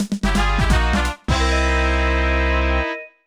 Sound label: Minigame Lost; Pokemon Stadium 2